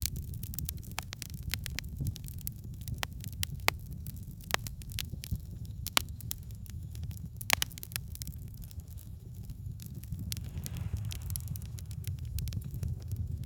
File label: winter campfire crackling